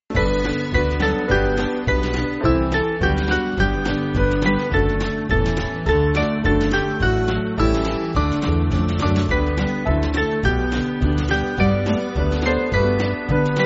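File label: Band, Backing Files for Churches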